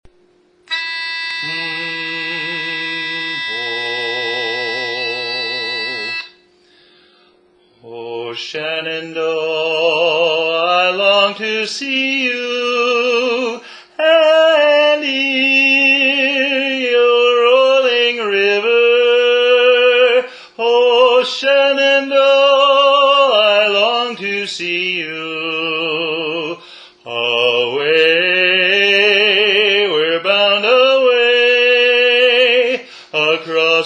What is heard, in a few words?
Tenor; Shenandoah